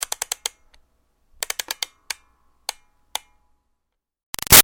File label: Toy Music Box Wind Up Slow; Music Box , Turn , Wind Up , Slow; Wind Up Toys